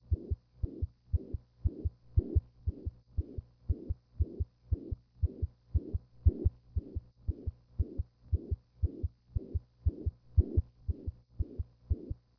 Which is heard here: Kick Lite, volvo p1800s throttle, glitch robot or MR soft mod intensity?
MR soft mod intensity